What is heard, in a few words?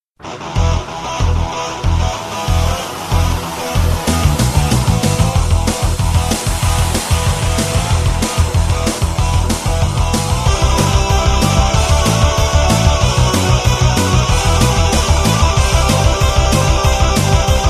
Instrumental